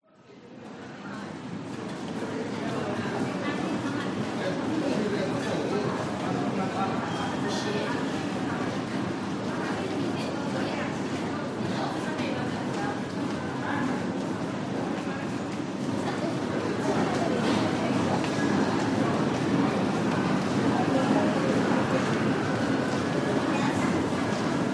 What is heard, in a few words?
bus station pedestrian area singapore; bedok mrt